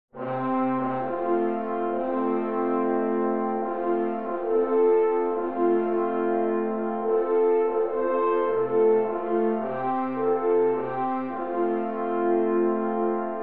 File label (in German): Alphornruf; Kaltentaler